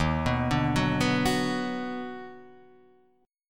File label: Eb Minor Major 7th Sharp 5th Eb down Guitar Half Step Down, chord